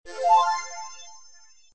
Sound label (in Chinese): 签到领取宝箱提示音